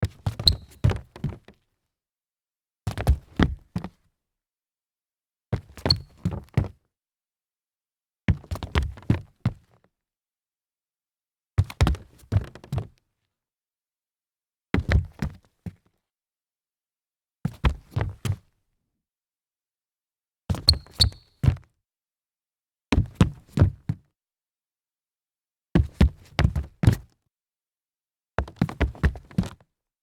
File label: sneaker stumble forward wood